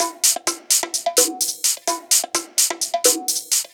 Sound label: Minimal Loops